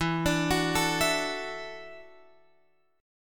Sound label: E 7th Suspended 2nd Suspended 4th Guitar Open F; arpeggiated